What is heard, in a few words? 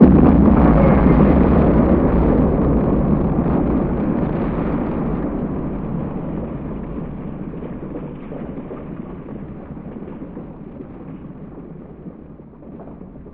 meganuke; Worms Armageddon